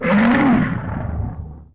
scrm; The Elder Scrolls III - Morrowind

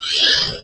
roar; The Elder Scrolls III - Morrowind

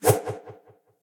slashes; SyrinScape; Temple of Urgathoa

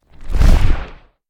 Dragon Wing Flap Big; SyrinScape; Hunting horror battle

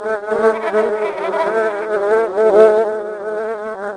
flies; The Elder Scrolls III - Morrowind; Morrowind GOTY GOG

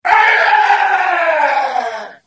Hit; The Elder Scrolls III - Morrowind

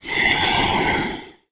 moan; The Elder Scrolls III - Morrowind

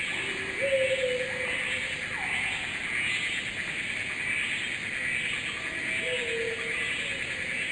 swamp; The Elder Scrolls III - Morrowind; Morrowind GOTY GOG

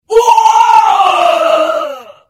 Hit; The Elder Scrolls III - Morrowind

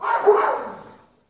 Riding Dogs; SyrinScape; Haunting part 1

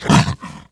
roar; The Elder Scrolls III - Morrowind

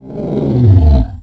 bellow; The Elder Scrolls III - Morrowind

